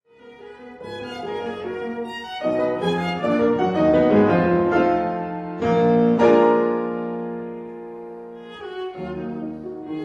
Sonata for Viola and Piano in E flat Major Op 120 No 2 II Allegro appassionato; Brahms